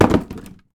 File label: wall cardboard box; Golden Crayon Sounds